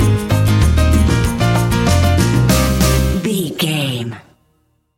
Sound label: sexy spanish latin salsa music stinger; An exotic and colorful piece of Espanic and Latin music